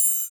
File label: HH HH, Dance